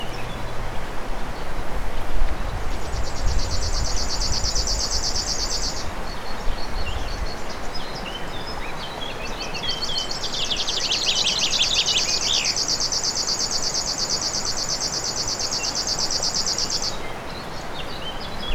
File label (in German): Schlagschwirl, Moos an der Isarmuendung; Moos an der Isarmuendung DEG; Michle Peron